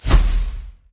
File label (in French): disparition